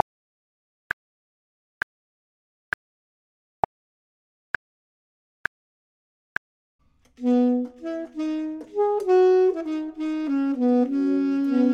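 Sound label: both, Saxophone and Clarinet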